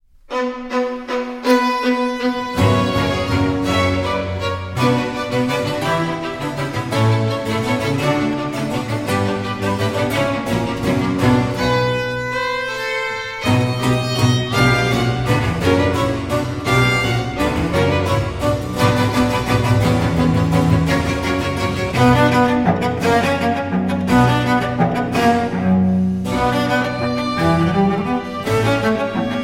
concerto for cello in c minor allegro ma non molto; rv 401 iii